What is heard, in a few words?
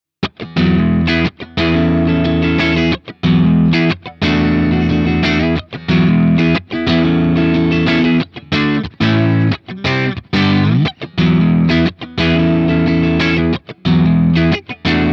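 Funky Crunch, guitar overdrive; Nobels ODR-Mini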